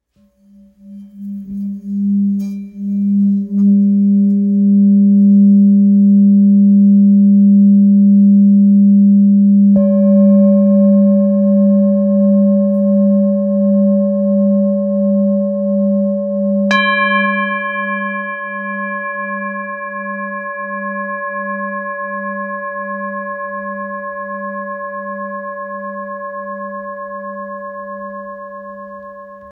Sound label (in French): Bengale 1.5-1.6kg Sol